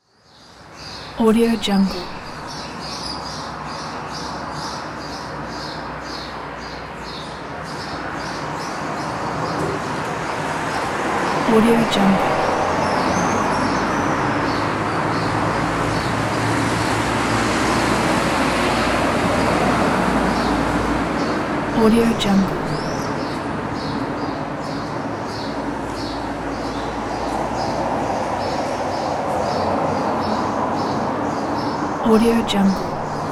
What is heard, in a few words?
city morning ambience with traffic